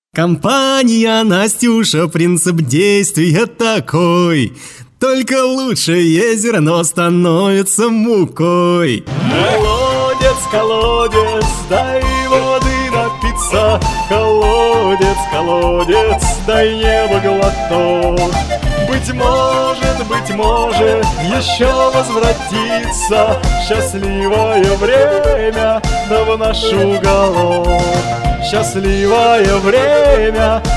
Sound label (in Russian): russkij narodnyj vokal